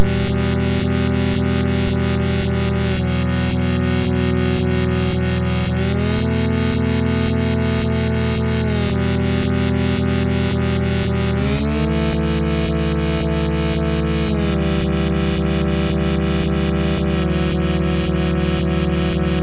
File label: Game Over CSG8580R5; Warzoid